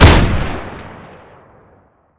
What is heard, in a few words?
nail; garrysmod